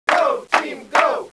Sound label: go team